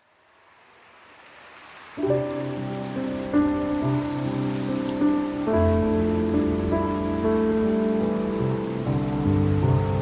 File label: Piano and Rain